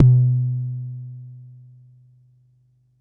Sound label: Bassdrum, Jomox Xbase09